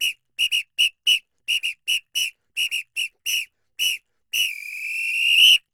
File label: Pito Murga 110 bpm; Best Service; Festa Latina VOL-1